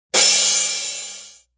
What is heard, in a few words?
Crash